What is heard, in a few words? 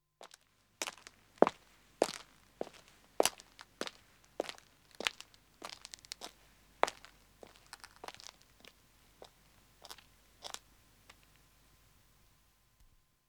Country Road Walking man depa, Footsteps; The BBC Sound Effects Library